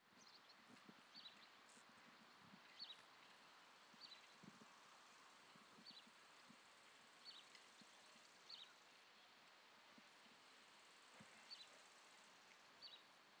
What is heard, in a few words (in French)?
Pépillement, Friche Industrielle Rhinau